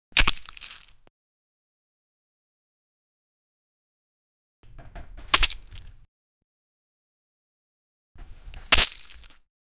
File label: a brutal series of punche